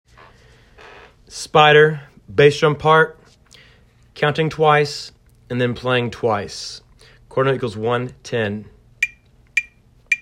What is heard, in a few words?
spider bass drum